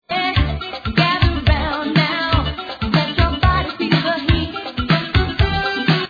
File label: conga